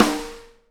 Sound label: SNARE RING, Live Snares; PlatinumPhattCD1; E-MU Producer Series Vol. 8 – Platinum Phatt